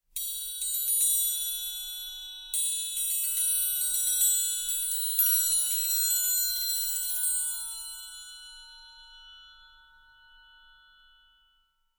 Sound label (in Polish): trojkat